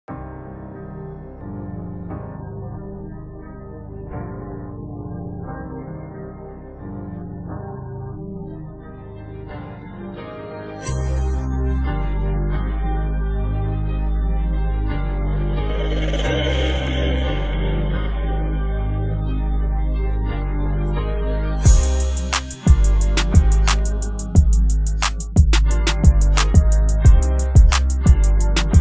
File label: INSTRUMENTAL